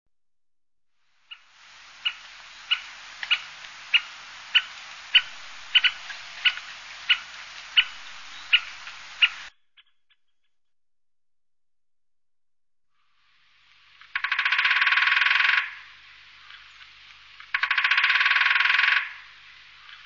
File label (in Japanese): akagera, birds